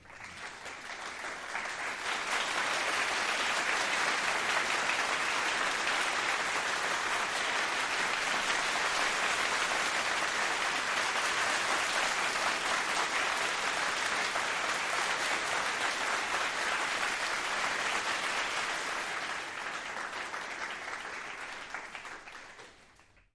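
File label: Crowd 100 Begin End, medium interior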